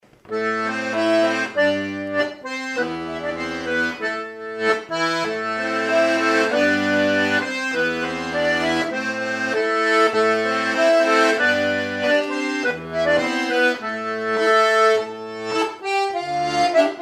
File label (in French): accordeon diatonique + voix; capsule lem; nagra; ares-m